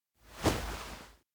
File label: hug knees; awayuki; anemoi パッケージ版 1.1